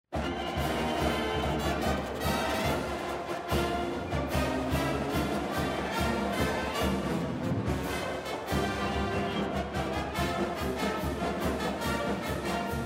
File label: Fight Song; Southern Miss